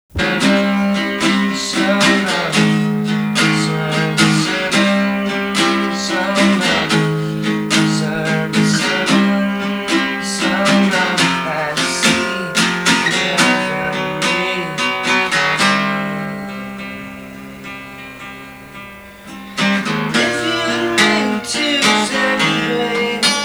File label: (Solo Acoustic)